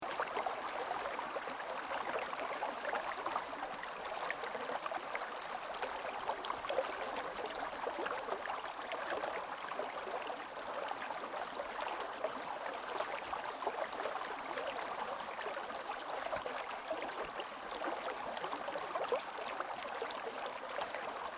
Nature Countryside Waterways River Water Flowing Over Rocks Bubbling Medium Speed Gentle